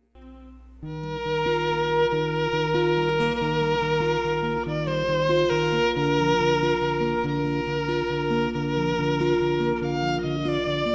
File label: duo violin guitar